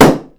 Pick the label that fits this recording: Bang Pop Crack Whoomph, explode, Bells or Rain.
Bang Pop Crack Whoomph